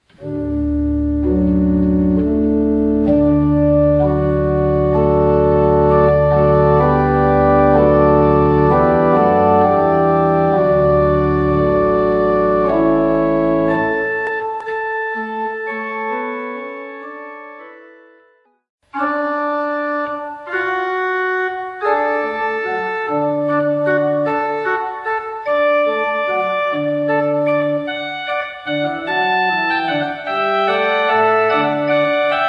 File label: Trumpet Vol in D-Dur; Goodwin William